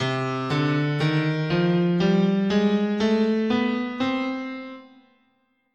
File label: C Bebop Melodic Minor, scale